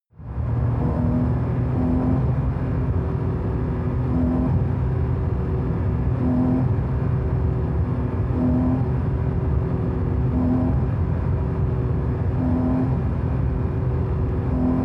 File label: sports car int engine drive short thrusts increasing rpm high; verkehr transport; autos